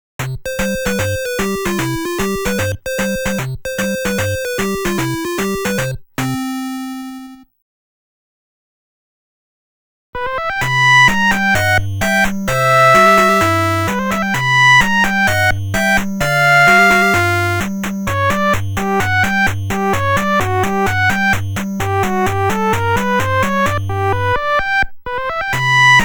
オープニング ／ メインテーマ ／ エンディング

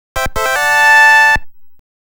クリア

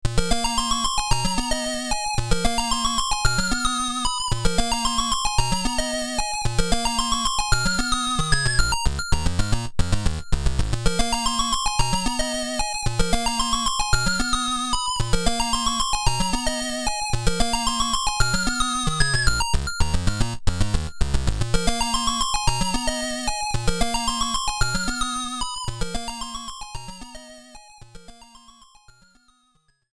死のテーマ(ゲーム・オーバー); Dragonslayer IV Draslefamily; MSX/MSX2 version

